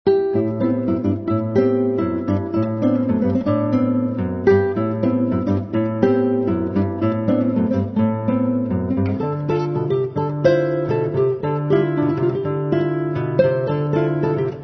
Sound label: Samba bebe